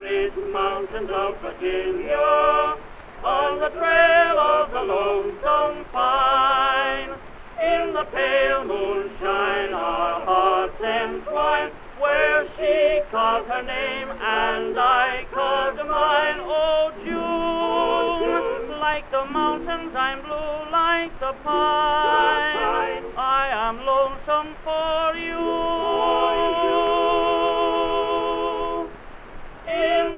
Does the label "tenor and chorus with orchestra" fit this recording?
Yes